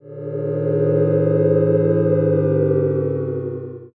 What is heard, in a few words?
cheer far